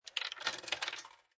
Insert Coin Into Coin Slot, Drop coin into vending machine; slot machine, arcade game or similiar; Arcade Sound Effects